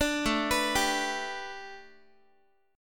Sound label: A 7th Suspended 2nd Suspended 4th, Guitar; A7sus2sus4 arpeggiated; A7sus2sus4 chord